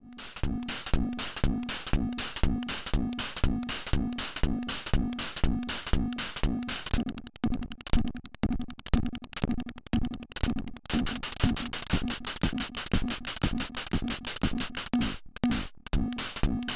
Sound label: Trigger Sequence phase locked LFOs; fourMulator